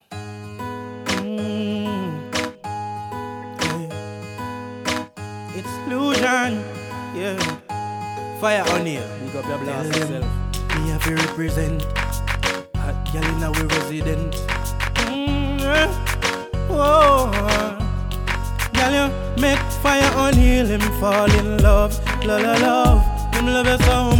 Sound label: real gangster dub